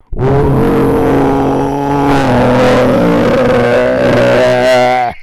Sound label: boat; nave